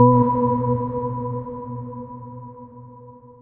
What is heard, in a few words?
Bright; Okitoki Games; EasyTalk